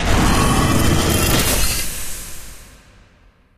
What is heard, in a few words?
pincer wall reveal up; Source Engine; Portal 2